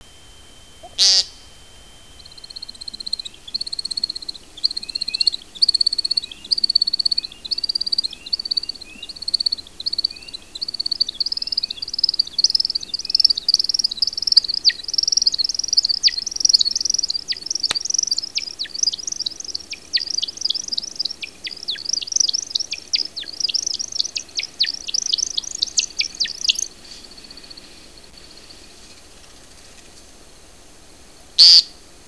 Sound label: woodcock display